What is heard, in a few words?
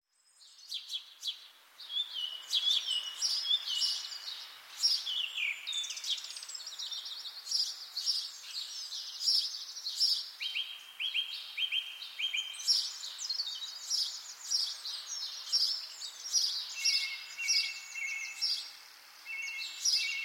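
sounds of birds singing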